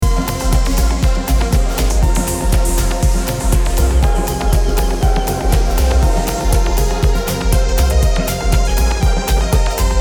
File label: Techno master IN